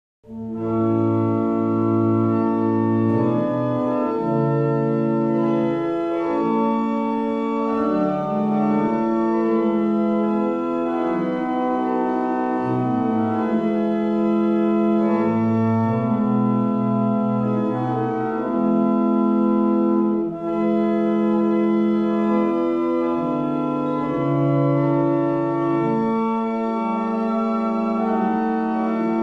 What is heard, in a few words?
Mount Sinai, barrel organs; Avington